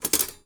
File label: FOLEY Toaster Eject; mono; Universal Sound FX